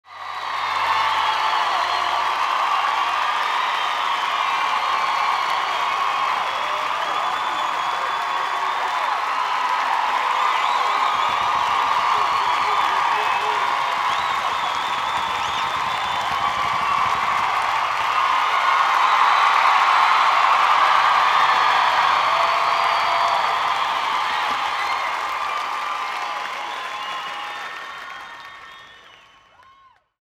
Crowd Huge Cheer and Applause

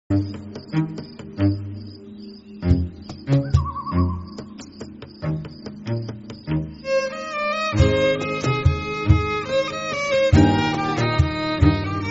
Mexican Death Music; Xochipitzahuatl Flor Menudita; Día de Muertos

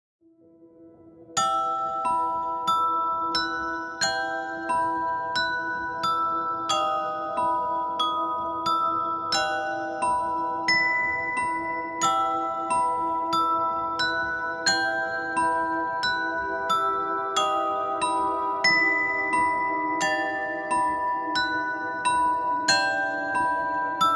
mysterious celesta

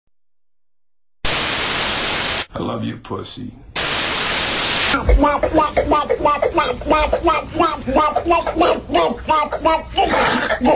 Sound Effects duck quacking; Howard Stern

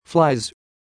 flies

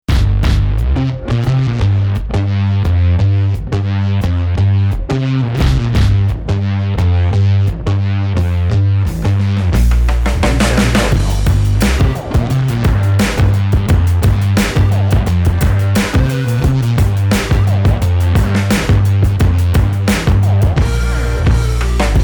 lofi nerd bass buzzer; bensound

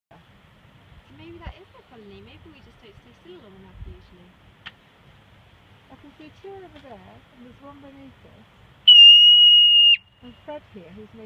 Marmot screaming on Blackcomb Mountain

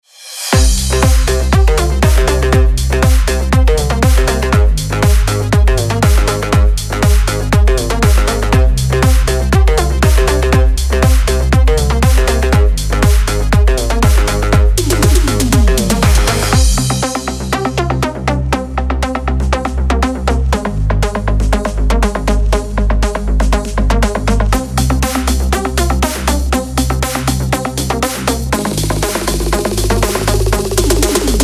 synthwave version background music; little charlie; background music for video 1 minute

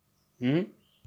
Mmh

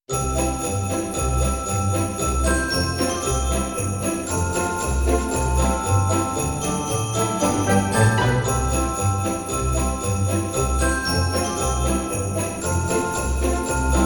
jingle bells orchestra

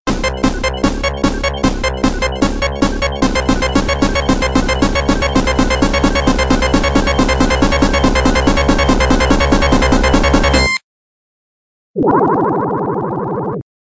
drowning music; sonic

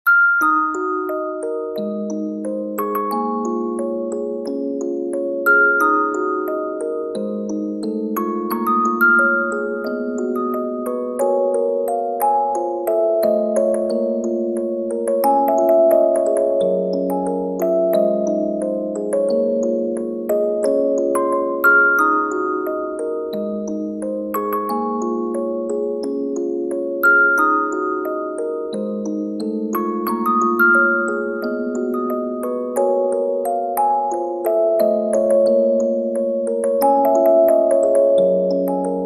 Music Box

